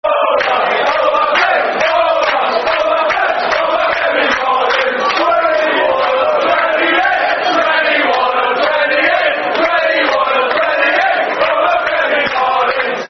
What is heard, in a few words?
Football Chant (Soccer Song), Newcastle United; Obafemi Martins; Nufc